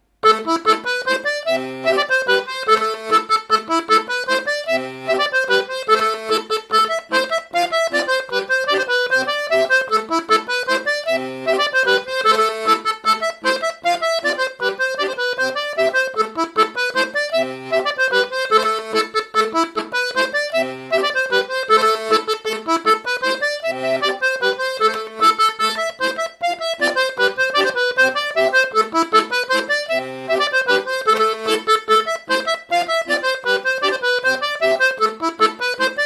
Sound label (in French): GAVOTTE MONTAGNE